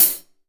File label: OHH, REAL DRUMS, SWING KIT; Best Service ProSamples vol.43; Real Drum Kits